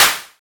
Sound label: original open hat sample d key, Royality free open high-hat sound tuned to the D note